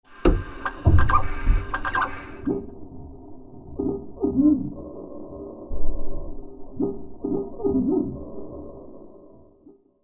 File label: hydraulic blast